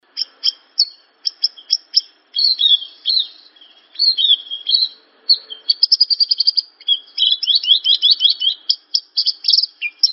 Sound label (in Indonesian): suara masteran branjangan